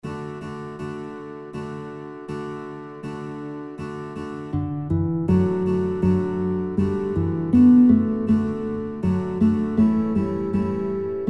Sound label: Guitar; Guitar Songbook; Weisst Du Wie Viel Sternlein Stehen; kinderlieder gitarre playalongs